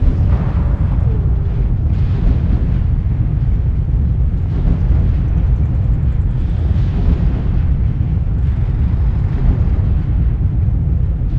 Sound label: spacebase indoors; ambient; Garry's Mod; tf2